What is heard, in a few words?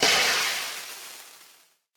grenade flare